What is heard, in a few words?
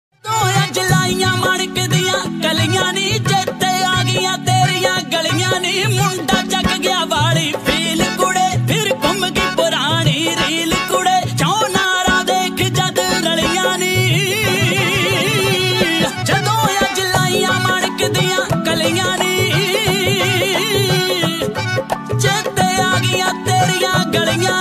Trending Punjabi Ringtone